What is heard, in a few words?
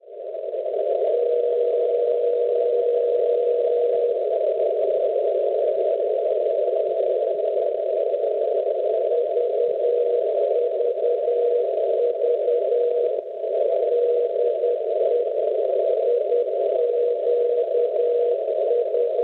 630 meters OM Beacon; IQ2MI